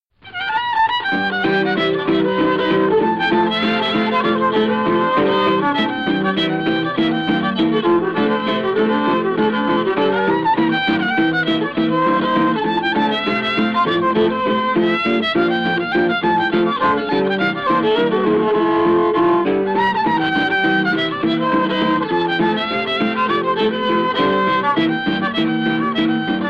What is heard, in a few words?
Garage Band